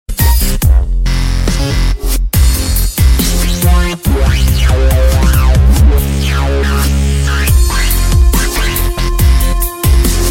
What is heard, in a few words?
iphone ringtone